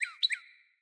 Cardinal Female Bird Double Chirp

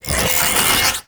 Lightning Stomp Cast; Warframe; The New War; Archon; Boreal; Abilities

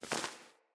Wing Flap One, Animals; Floppet

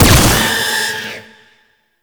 Fist Claw Spike Spawn; Weapons; Warframe; Tenno; Incarnon

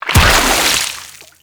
Enemy Ink Explosion; Gameplay; Warframe; Shadowgrapher

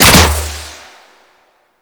Antler Bow Fire; Warframe; Weapons

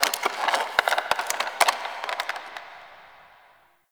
Zariman Wood Creak; Warframe; The New War; Ambience

